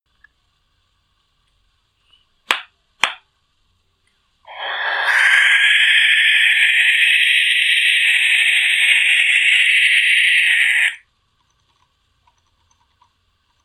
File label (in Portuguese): Canto da Coruja Rasga Mortalha